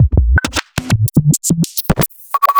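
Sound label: Roland MV8800 drum SCRATCHY KIT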